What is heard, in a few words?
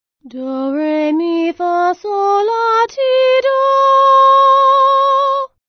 Solfeggio